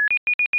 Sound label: Alarm, 8bit; first generation iPod sounds; i OS